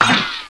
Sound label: Laser Lab Sound